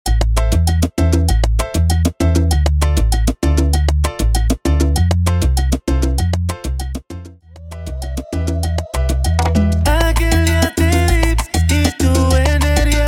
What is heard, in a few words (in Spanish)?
Transicion Salsa To Reggaeton 98 BPM; transition salsa to reggaeton